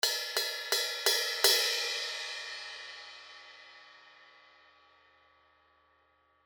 16 zildjian medium crash bell hits

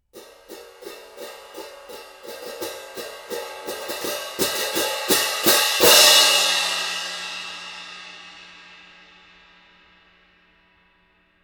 16in a zildjian z-mac with gromets

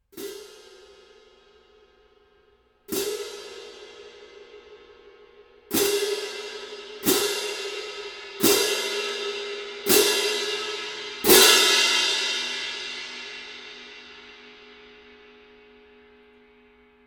19in zildjian classic orchestral medium

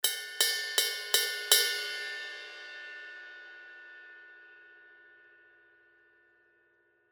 19 zildjian thin crash bell hits

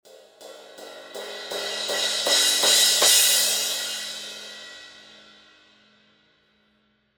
18 a zildjian fast crash, crescendo hits

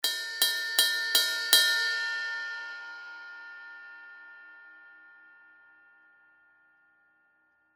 zildjian heavy crash bell hits

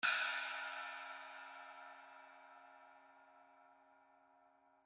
21 zildjian ultra hammered china brilliant

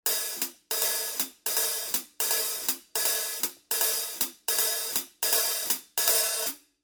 quick beat hh open closed pattern

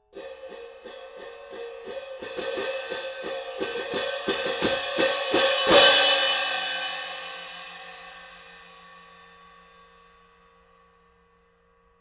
16in a zildjian stadium medium

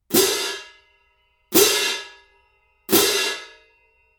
19in zildjian classic orchestral medium

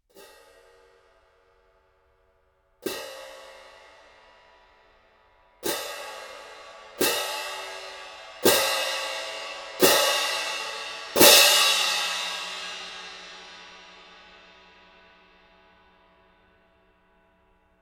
16in a zildjian z-mac with gromets

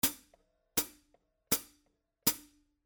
quick beat hh foot chicks

